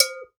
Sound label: GANKOKWE, Drums&Percussion, Gankokwe Bells; E-MU Producer Series Vol. 7 – Old World Instruments